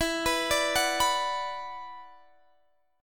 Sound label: F sharp 7th Suspended 4th Sharp 5th E